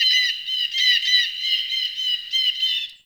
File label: Forest Open Glade Bird Med, Day; Ambience